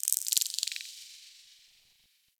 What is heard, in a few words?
Tech Very Small, Ambience; Warframe; Cephalon